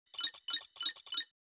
Hologram interface data scanning and activating

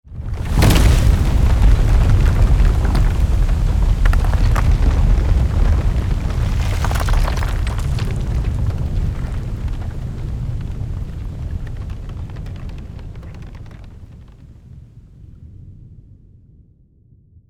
Volcano lava eruption explosion debris; nature

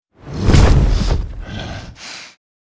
Werewolf heavy landing impact

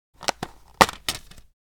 Plastic CD case opening

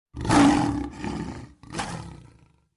African male lion roaring

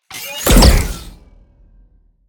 Sci fi heavy gun single blast

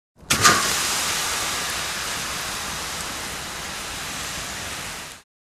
Fire extinguisher spray long

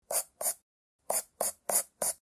Pencil drawing very short stroke

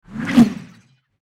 Infographic data chart zoom in

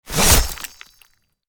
Guillotine blade drop impact hit; medieval

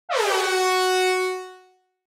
Reggae air horn F3 sharp

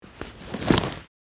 Cloth bag put down

